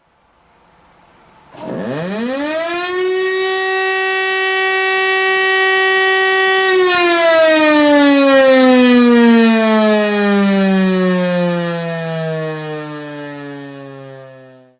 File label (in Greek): ΣΕΙΡΗΝΑ ΠΟΛΕΜΟΥ